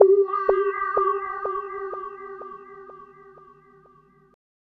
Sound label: Rhodes FX; Drum&Bass; fx & sweeps; EZRollers